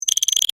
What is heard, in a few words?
Multimedia Chime, Technology, Multimedia, Chime; Chime Sound Effects; Chimes (Sound Effects:Multimedia); BlastWave